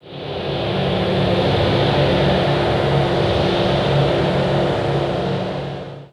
DEEP METALLIC ATMOSPHERE; DEEP ATMOSPHERICS; Sonic Foundry (Sony Creative Software); Vir Unis Infusion

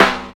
SNARE4; East West - Phat & Phunky CD2; 90's Sample CDs

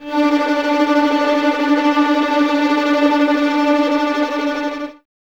VS D 4 Tremolo, 11 Violins; Miroslav Vitous

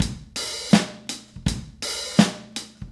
Soul Drums, 70s Analog Funk; Sonic Foundry (Sony Creative Software); What it Is!

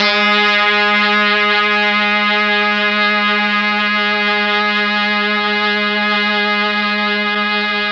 G#2 Brass, Mellotron MKII; Mike Pinder - Mellotron Archive CD

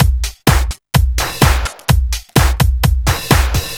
Trash Floor, Drum Loops, 127 BPM; Sonic Foundry (Sony Creative Software); Underground UK House & Electro

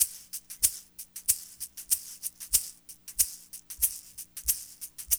SHAKER, Funky Ass Loops; East West

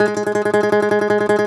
DOMRA, Segundo Domra, Plucked Strings

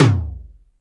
DRYTOM LO; Back In Time Records; Big Bang

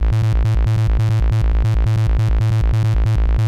hi energy, techno 138 bpm; Best Service ProSamples vol.54; AKAI

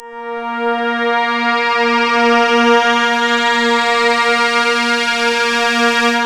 A#, Synclav FM; Big Fish Audio; SPACE2

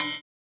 Cymbal, jungle breaks drum sounds; ORIGINAL JUNGLE BREAKS; JBK CYMBALS

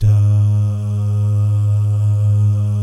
DAH, Vocal Jazz; ILIO

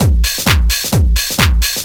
drum 130 straight; Techno Trance Essentials

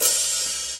HH, HIHAT HIP, Dance Mega Drums; 90 s Sample CDs; Best Service

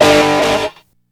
GRUZZ RIF, Stereo Guitars; E-MU Formula 4000 Series Vol. 1; Hip Hop Nation

